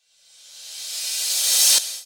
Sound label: Cymbals Reverse